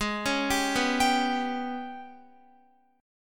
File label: Ab-Minor Major 7th Double Flat 5th, down, Guitar; AbmM7bb5; arpeggiated